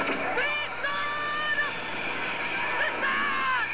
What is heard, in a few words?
blaster